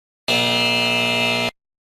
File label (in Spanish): error programa